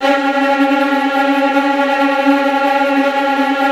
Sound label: STR TREMOLO, Strings